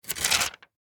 Notebook Tear, Office, Notebook, Tear, Single, Sheet; Paper (Sound Effects:Offices); BlastWave